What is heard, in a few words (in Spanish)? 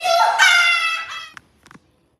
risa del diablo